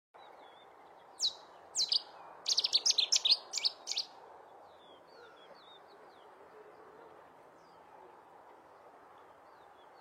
canto do rouxinol bravo

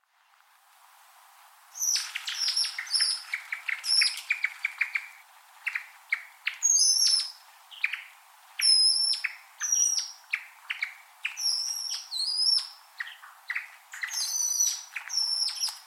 Canto do faisao lady

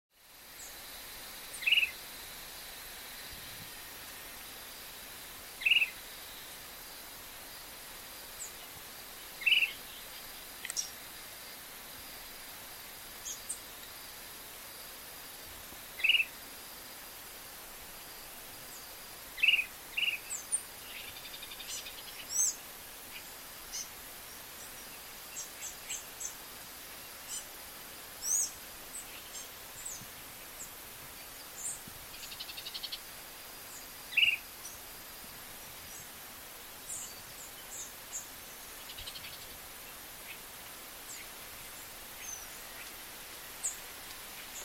Canto do maria cavaleira

